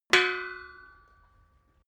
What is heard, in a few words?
metal impact pole